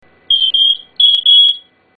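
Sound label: Take Time, whistle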